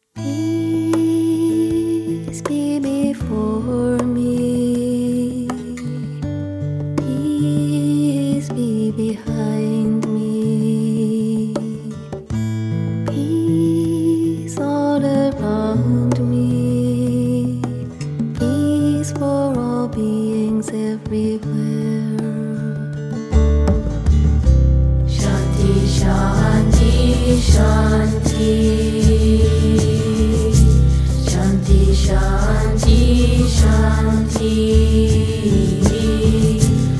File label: Shanti Peace